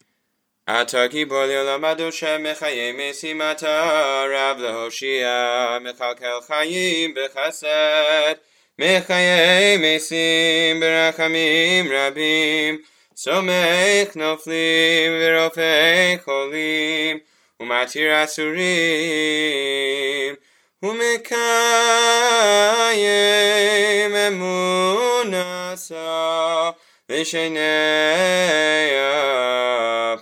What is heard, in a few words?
Melody; Musaf; Ata Gibor; Anim Zemirot